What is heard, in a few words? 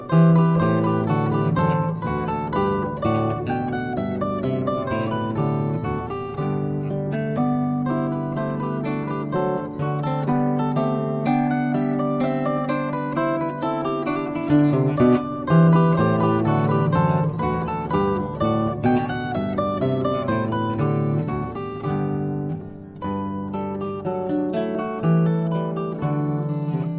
Gavotte; klassik